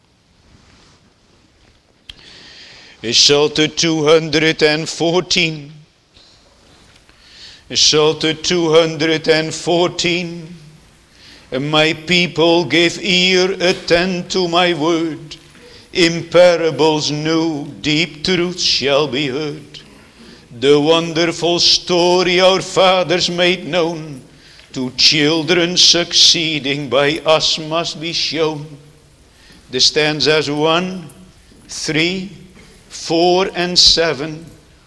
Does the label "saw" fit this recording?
No